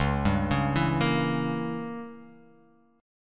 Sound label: Db Diminished 7th Db down; arpeggiated; JGuitar